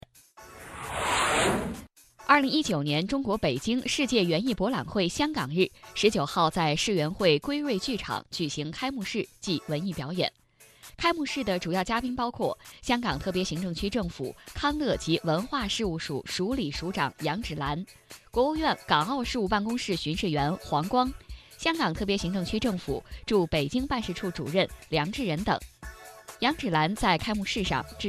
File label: tuning audio